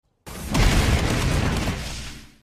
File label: consecutive punches